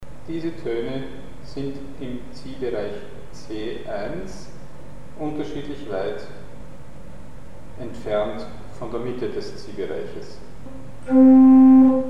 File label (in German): Unterschiedlich weite Entfernung von der Mitte des chromatischen; Mikrobuch